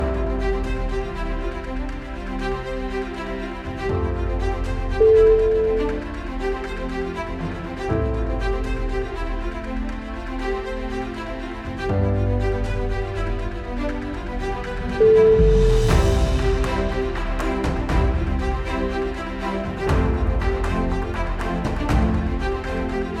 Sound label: big triumph